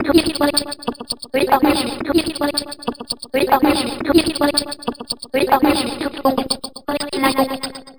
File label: Mouth Music, Vocal